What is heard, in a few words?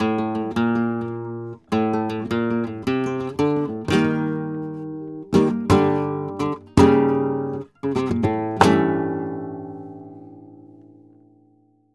Remates De Pulgar, WAV Guitar, Flamenco; ProSamples vol.46 - Flamenco